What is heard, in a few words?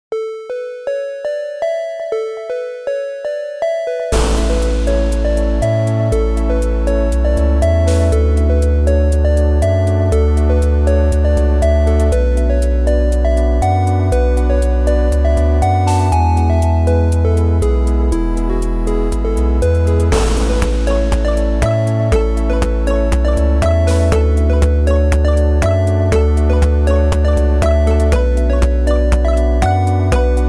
8 bit dream land